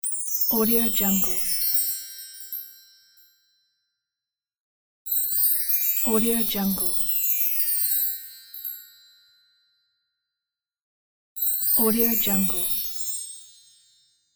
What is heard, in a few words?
magical sprinkle chimes